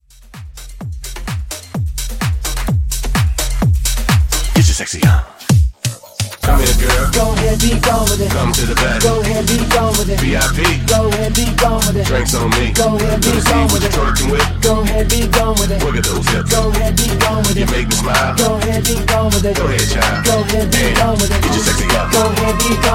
Quick Mashup 128

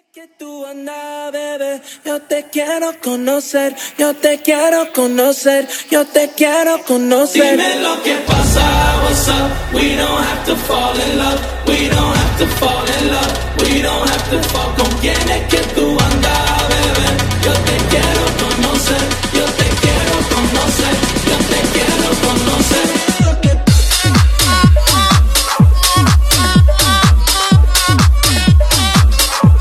Acap Intro Tech House Latin 125 BPM

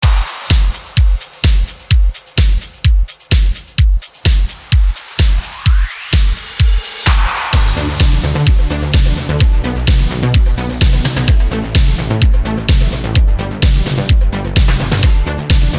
club trance 128 bpm

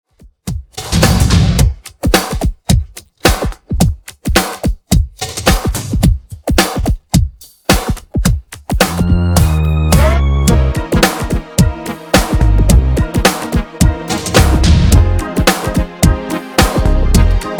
Re drum

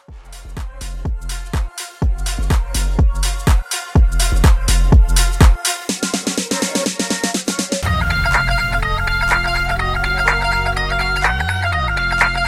Hybrid Edit 124

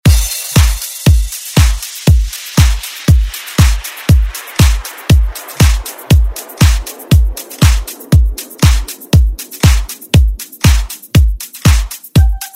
Pop Dance Redrum

